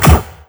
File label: darkness power shot; cstrike